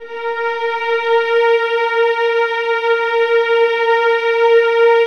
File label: VIOLINS C R, Synclavier Strings; ILIO